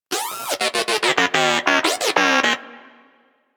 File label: Moa Inquisitive Vocal Studious; Warframe